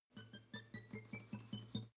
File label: string chirp ascend